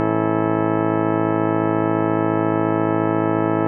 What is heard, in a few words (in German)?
Schussakkord tief